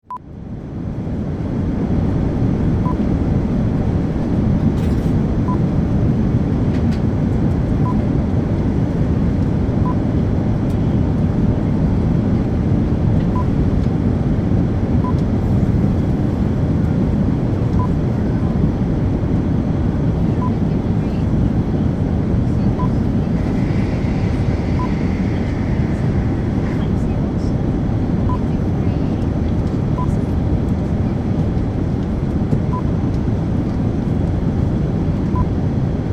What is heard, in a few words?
Airplane Inflight Atmos